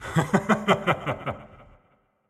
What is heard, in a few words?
Laugh